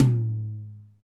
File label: TOM TOM, Real